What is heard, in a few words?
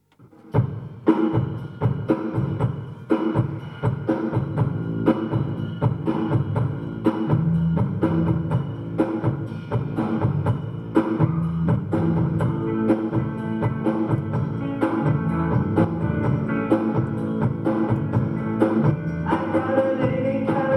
blues; liverpool